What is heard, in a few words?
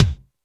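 kick Head Pnch, Alesis D4; 300 Drum Machines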